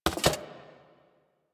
Investigate Putdown Tablet; Warframe; Cephalon Weave

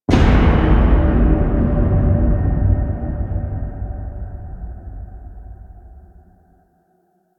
Opening Hit; Warframe; Quests; The New War; Sealab; Hunhows Lair; Hunhows Lair Part One

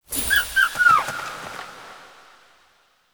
Fawn Mote; UI; Animal Motes

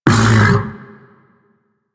Vocal Tranq Grunt, critter; Warframe; Hunting Critters; Undazoa

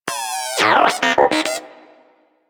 Moa Inquisitive Vocal Greeting, Pets; Warframe

